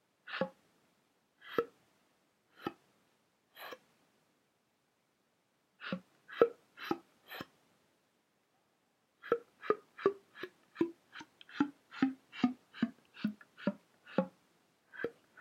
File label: tongue rams without mouthpiece and barrell